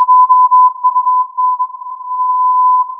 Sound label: filtered input